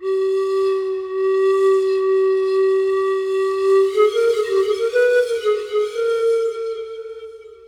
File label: flute, ethno; eJay